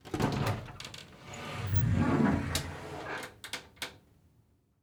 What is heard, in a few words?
Household Door Bi-fold Door Open